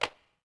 bad run, droids robots

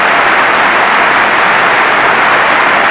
Large Crowd Clap; David Beckham Soccer; XBOX

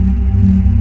drone loop, ships engine; Quantum Redshift; XBOX; yuri

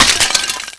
break tiles

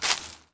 Small step, Dino

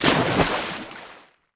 Splash

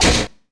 menu; Tony Hawk's Project 8; XBOX

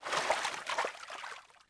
water exit

